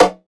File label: drum perc short bongo; Virtual Riot; Drum Hits